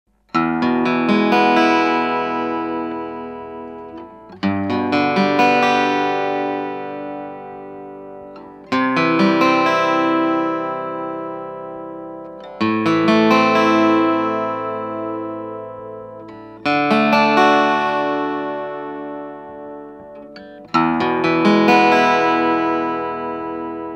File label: big piezo; 30mmピエゾの音; エフェクターは一切かけていません; コンタクト